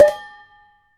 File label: a short crisp pot lid, percussive